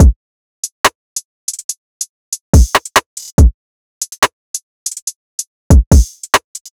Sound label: Drum 142 BPM, drill; tempest